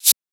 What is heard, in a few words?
Shaker; FLPRO